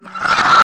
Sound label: Super Cold Slurp